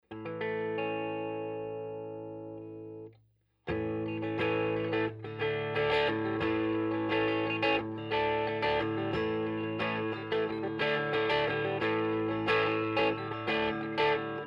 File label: Clean